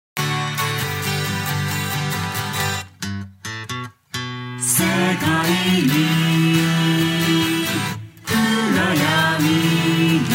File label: guitar short singing